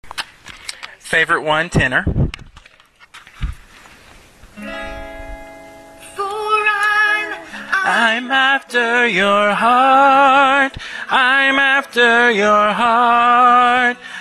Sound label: tenor